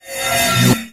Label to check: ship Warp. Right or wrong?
Right